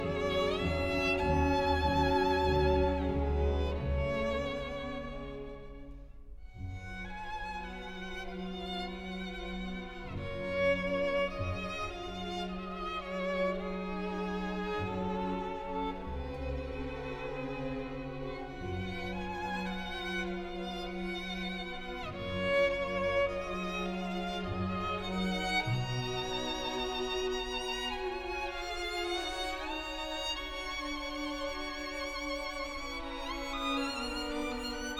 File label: Violin Concerto Andante sostenuto; Dennis Burkh; Janacek; philharmonic orchestra